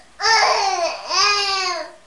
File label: baby crying